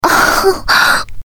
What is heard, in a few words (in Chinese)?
女呻吟 大